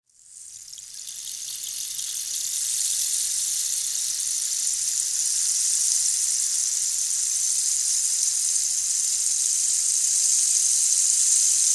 shower tablet

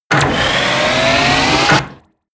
wind up

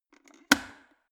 plastic water boiler open lid fast

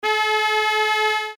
motorbike horn

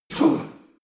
choir voice male whisper

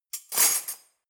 cutlery knives drawer place hard

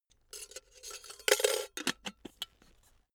can close rattle

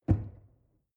drawer narrow close lock

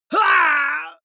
male fight pain shout

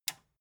metal button tiny release disable

